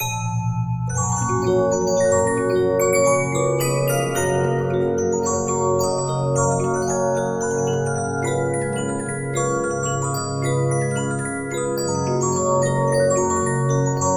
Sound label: Regina, 15inch disc musicbox